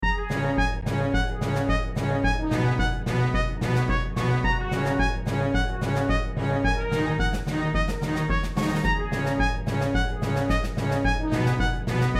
Orchestra

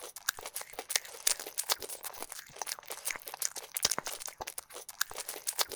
rat eating

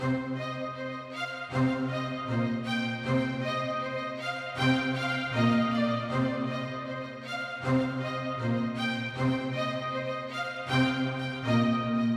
Medieval Transition

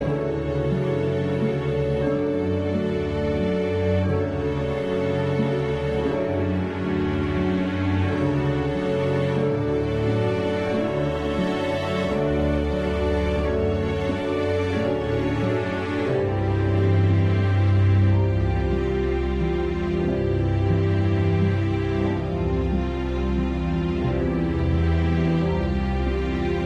Warm Loop

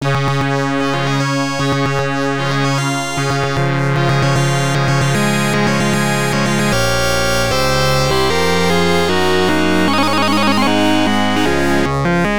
Wedding March; cobra mission panic in cobra city; ibm pc at

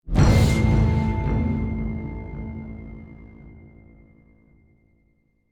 Sad (Stinger)

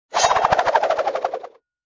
sardine; Angry Birds Space

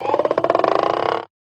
Punter windup; Fight Knight

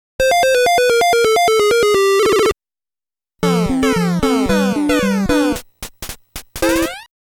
Game Over Result Clear

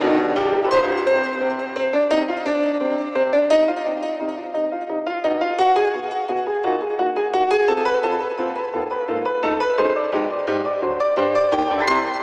downed loop 172bpm; chicory a colorful tale; macos ps4 ps5 switch windows gamerip 2021

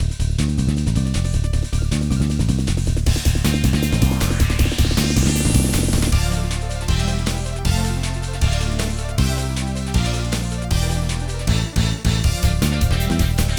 Mentor Stage Karaoke; Pinky Street Kira Kira Music Hour Pop Princess

